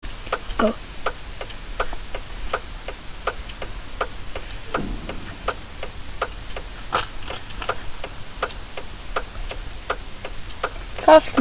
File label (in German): blinker